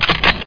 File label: MORTAR